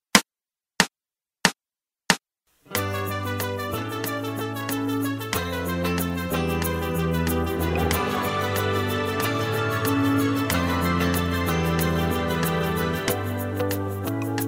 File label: mono click